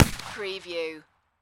Rifle Thompson Center Encore 209 Muzzle Loader 50 Cal Single Shot At 45ft